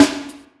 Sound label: snare, drums; virtual drum sounds; custom drums